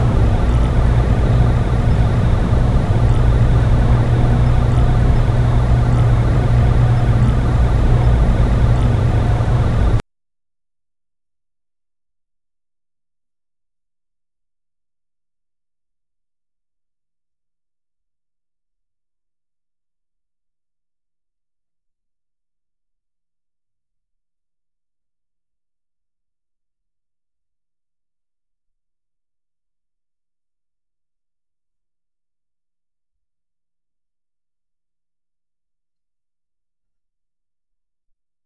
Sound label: garage room tone empty